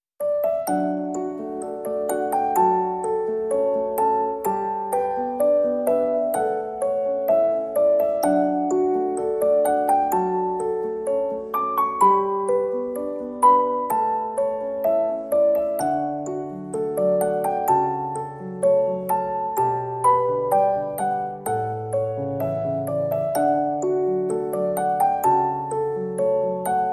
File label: Piano & Music Box